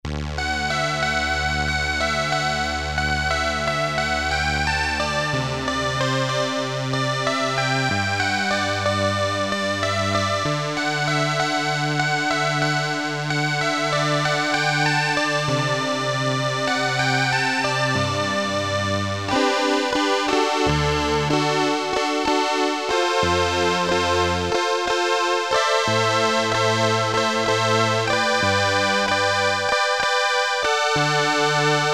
SYNTH STRINGS, Casio CZ-1000; Preset

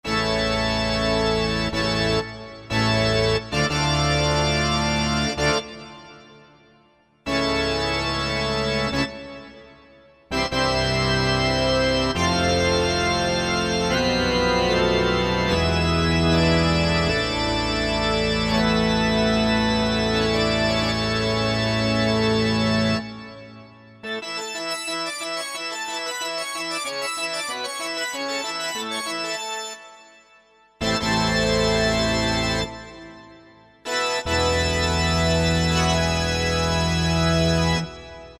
Euro Pipe, Korg N364; Factory Prog demos

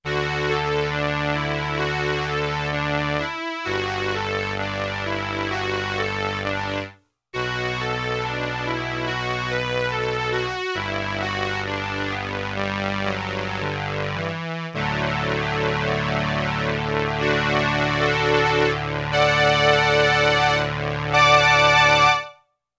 BRASS with vibrato & Ensemble II, Roland RS-202

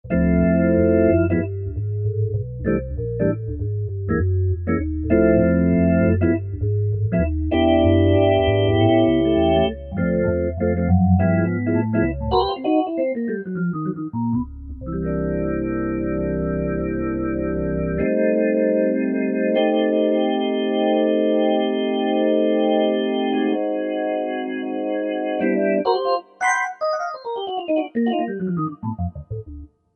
Jazz4 + RS, Korg MSC-9S Organ

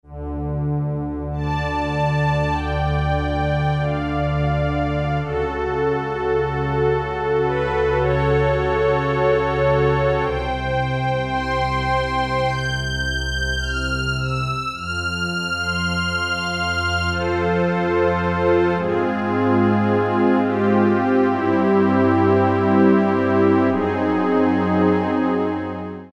Strings; Roland D-110

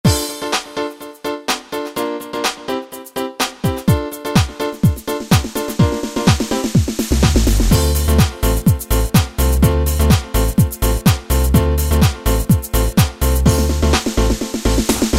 Club; Yamaha DJX; Styles

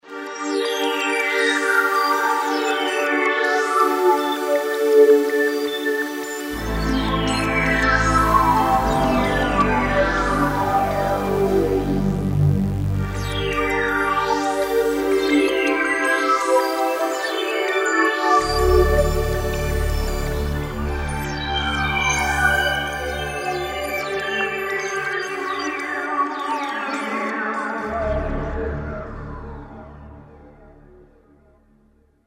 Multi Texture, Kurzweil K2000; Kurzweil K2000 V3

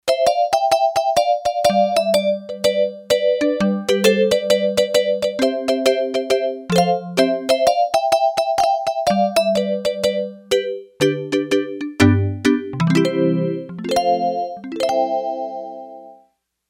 DX Marimba, Yamaha TX802; Factory Patches; Voices

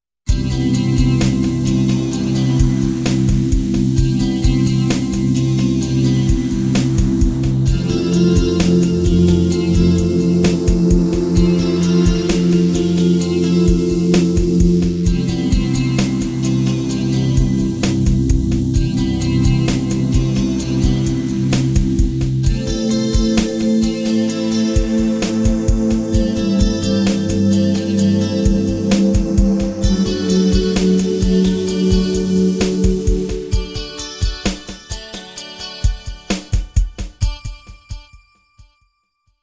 Love to Play Pad

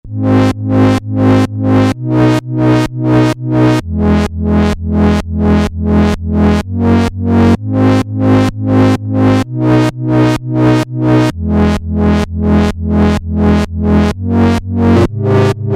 Pumpdriver

